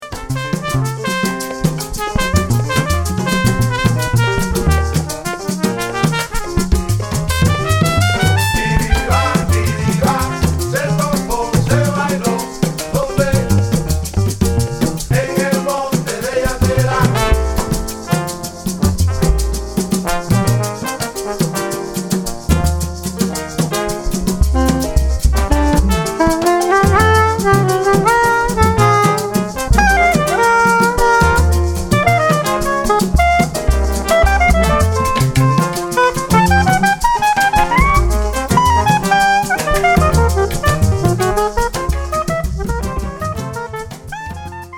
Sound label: Tumbao; Yateras